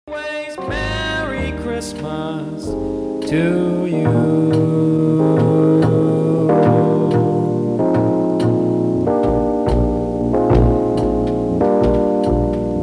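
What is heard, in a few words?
trumpet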